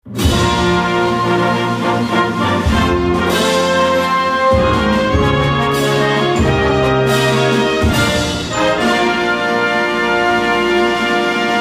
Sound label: music